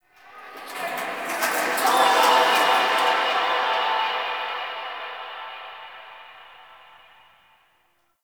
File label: Memory Echoes; Ambience; Warframe; TheNewWar; Zariman